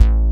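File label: Arp Bass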